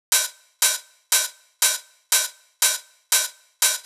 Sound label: Big EDM Hat Loop, 120 BPM; Future House Tools; Big EDM Drum Loops